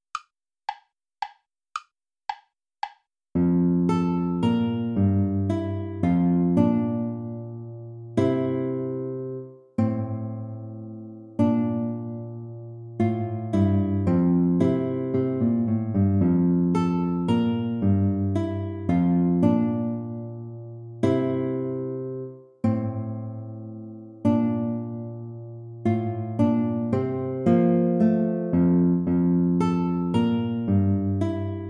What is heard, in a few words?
minuet major play along